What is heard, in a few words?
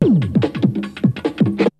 BREAKBEATS, TECHNO